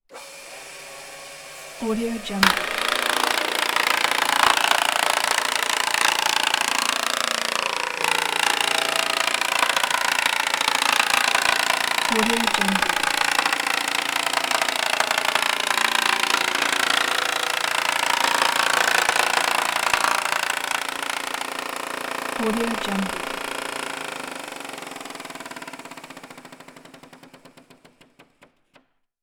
planing boards on the machine; fatsound

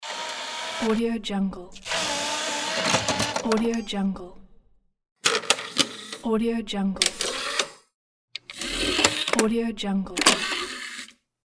cd player; audio therapy

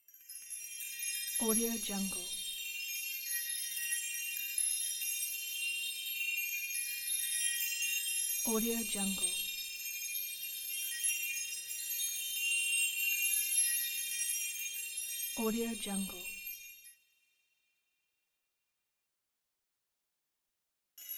magic glitter

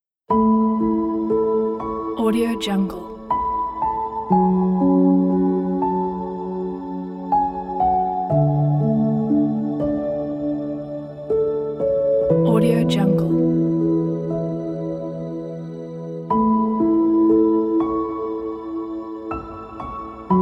forest whisper soothing relaxing piano; yavorivsky

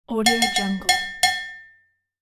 urgent cowbell tapped five times

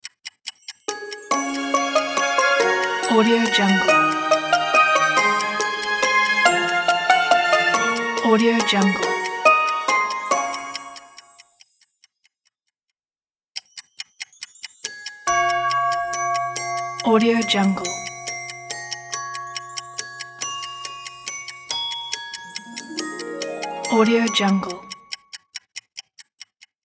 we wish you a merry christmas logo

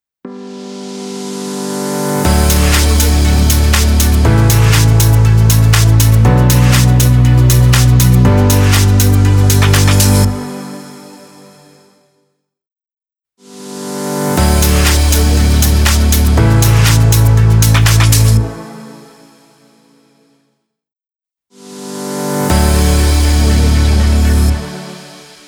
logo upbeat dance house

